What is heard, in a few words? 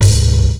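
bass drum d&b; 300 Drum Machines; Yamaha Motif ES-Series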